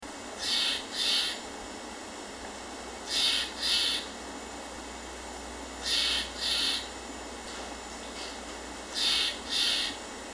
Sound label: Stellers Jay Call, birds